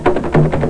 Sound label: drum beat, electronic sounds; Sound Effects for Multimedia - Volume 1